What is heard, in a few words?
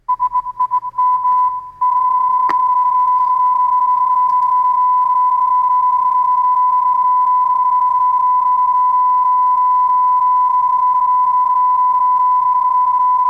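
sim31, digital mode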